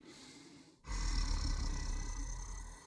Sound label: sleeping; Rust